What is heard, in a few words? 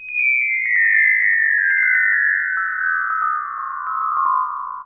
chimes, synth chimes; Zero-G Ambient 1